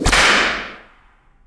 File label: discipline device impact; tf2classic